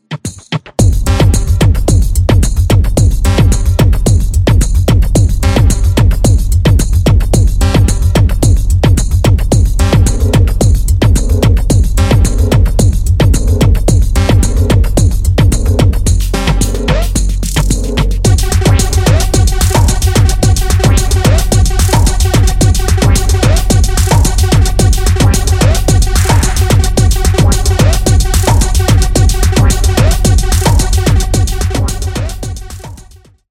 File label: Oil Drum